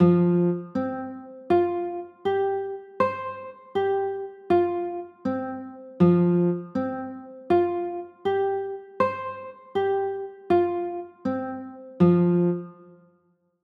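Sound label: F sus2 chord, guitar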